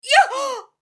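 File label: sounds of unpleasant shock in a person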